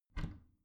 bathroom cabinet warped open soft; objekte; tueren

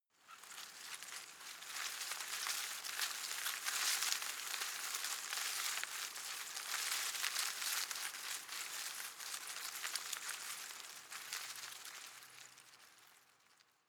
shrub small leaves rustle medium; haus-garten

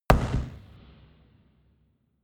gun pop gun pop

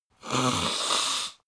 nose blow dirty; soundpool

